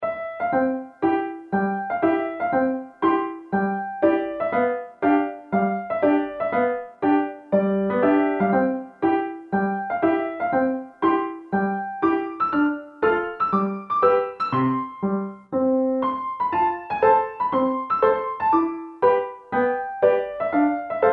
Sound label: kawaii piano